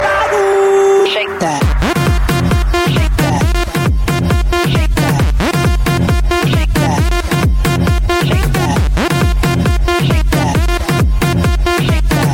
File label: house music